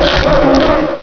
sting; Hexen II; pest

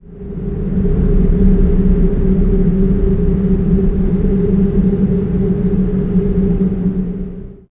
WIND low; thief

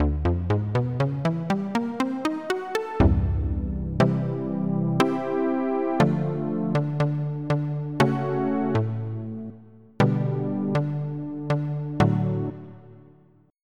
Synbass

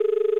err

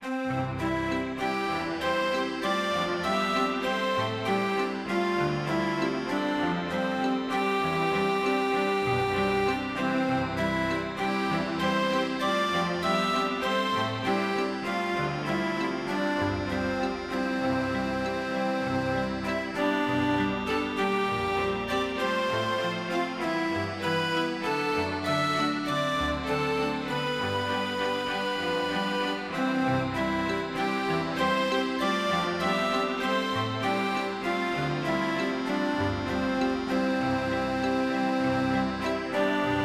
tune

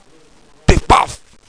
PIF PAF

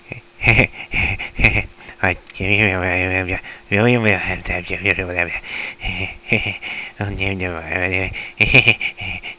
babbling

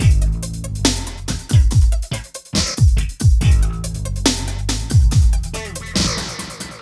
Ambi Groove; Sonic Foundry ACID Music

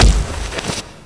rope; SFX; PC Gamer IT CD 38; thief

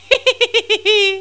wrong

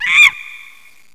die; Monkey Shines 1.2.1

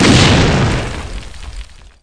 m79 explosion; Soldat

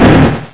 wall hit; dosgames; roc

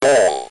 ball

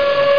AIRSP; trakatak

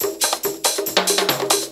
Tribal, Drums; TechnoMusicMaker

